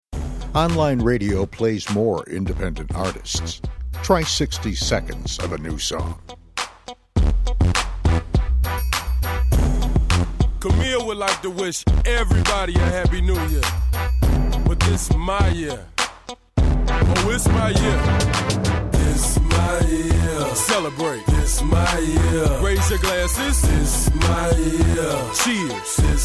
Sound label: hiphop